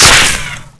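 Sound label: ricochet dirt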